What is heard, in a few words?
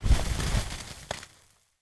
objects, World of Tanks